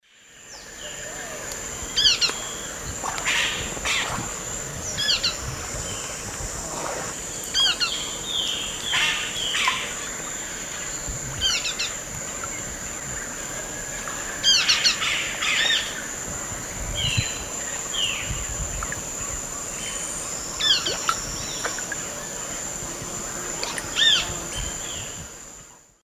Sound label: Myrmotherula multostriata, calls male; S. America; Eastern Lowlands